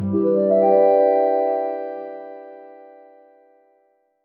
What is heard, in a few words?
metro public address chim